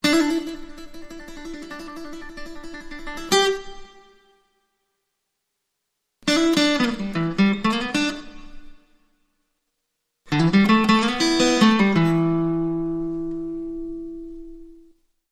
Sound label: Blues Licks